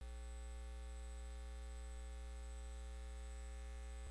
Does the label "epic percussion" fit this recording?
No